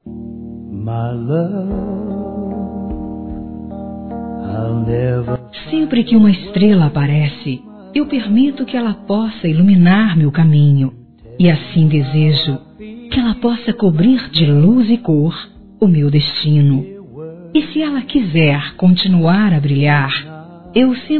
Conquista Feminino; Conquistas Cantadas paqueras; Telemensagens; Telemensagens 2017

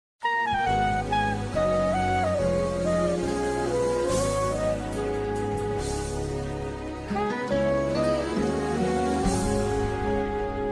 Romântico Sensual Feminino; Romantica

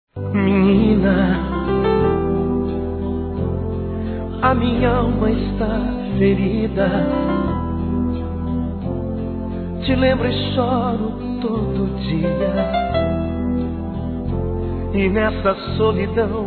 Adorei te conhecer, Conquistas Cantadas, fem; Conquistas Cantadas paqueras; Telemensagens; Telemensagens 2017

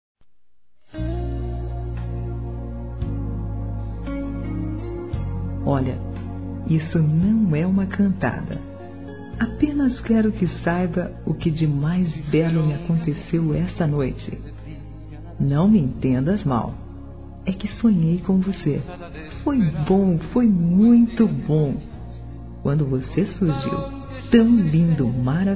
SONHEI C VOCÊ F; Conquistas Cantadas paqueras; fem; Telemensagens; Telemensagens 2017

